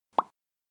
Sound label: POP